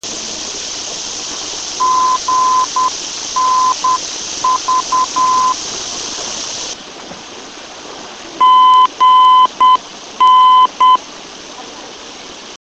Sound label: beacons